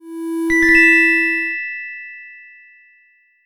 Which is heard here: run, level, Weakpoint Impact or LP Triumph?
level